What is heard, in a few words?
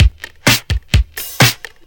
Housebeats, 128BPM; Vengeance Samples; VEH2 Loops